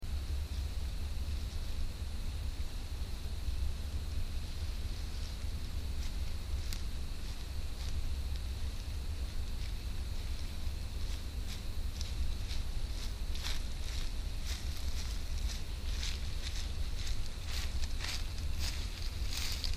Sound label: walk up; Louisiana